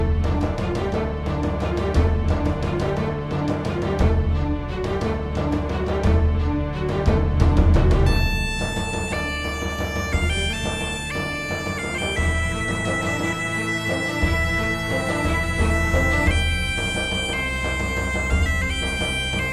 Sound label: Bagpipe Tone